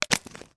household bath sprinkles small bottle lid screw on; zapsplat